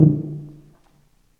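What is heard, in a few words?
brass tuba C, staccato; Solo Brass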